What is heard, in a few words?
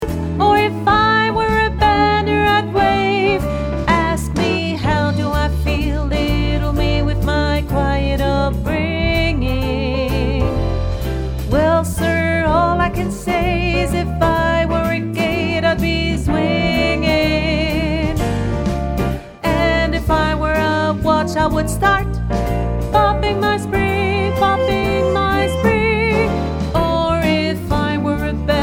Alto, ensemble vocal jazz; ensemble vocal jazz de larquemuse